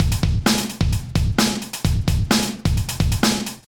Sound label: Drumkit; Ableton; Factory Packs